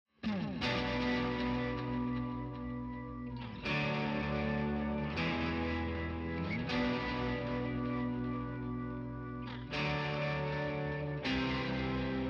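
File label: guitars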